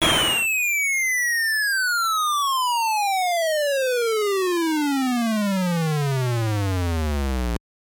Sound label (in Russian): zvuki dlia uvedomlenii na telefon v stile 8-bit